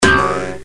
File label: Stop Sign Hit; Looney Tunes - Acme Rocket Dash